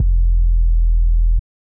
808's

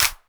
snare

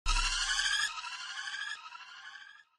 riser effect

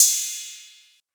crash one shot ride; Crashes & Cymbals

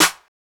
Claps

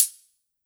HI HAT

